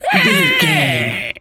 pig scream

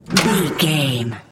metal door hatch open squeak

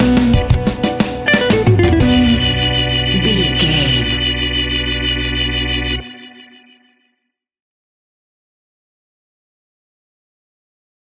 reggae music stinger

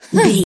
voice female effort jump action

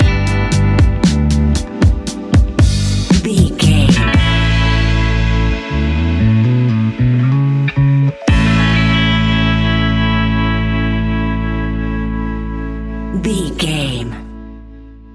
chill out, A relaxed and chilling piece of music

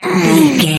voice female effort quick action

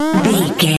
retro teleport warp effect

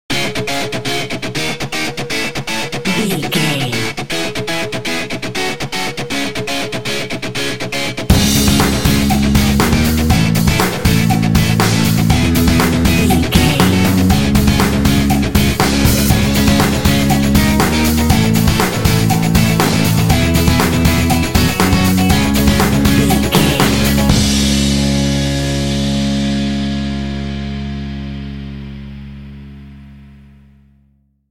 indie funky dance sting

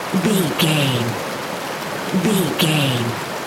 river stream flowing water loop short version

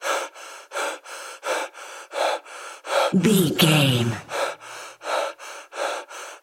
voice male breathing mask loop jog

